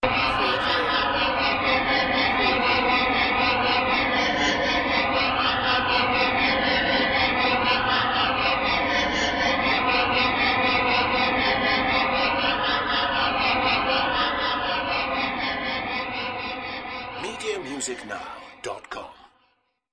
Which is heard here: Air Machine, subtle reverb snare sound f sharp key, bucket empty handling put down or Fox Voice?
Air Machine